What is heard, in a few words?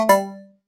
shop welcome; NPC Dialogue